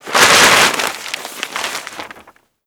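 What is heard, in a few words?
destruction news stand paper; Explosions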